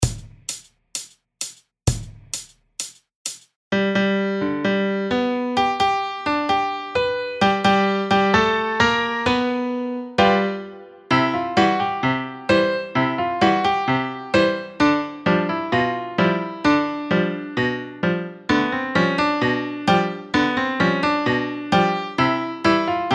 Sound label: ragtime march